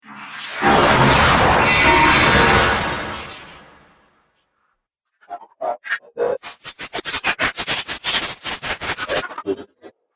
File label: Dubstep Scifi Effects